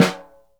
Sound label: SNR, Synclavier Percussion 2 - World & Orchestral; ILIO